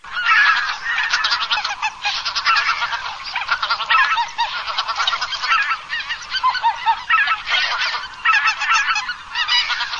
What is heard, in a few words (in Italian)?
OCHE LOMB